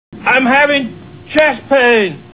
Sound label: chest pain; firearms